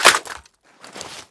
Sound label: mac10 mag out, weapons